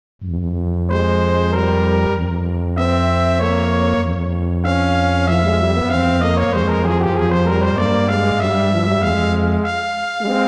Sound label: trio